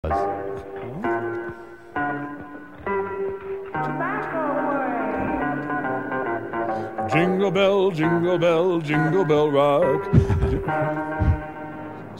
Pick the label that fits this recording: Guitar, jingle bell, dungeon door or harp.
jingle bell